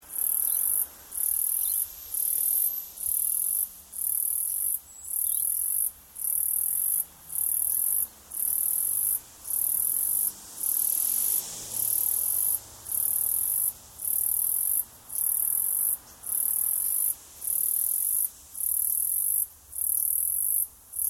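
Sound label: Insect Australian Cicada Long; Various Australian insects; Cicadas (Sound Effects:Animals:Insects)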